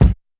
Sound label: dry kick drum sample a sharp key, kick single hit tuned to the A# note; kick drum samples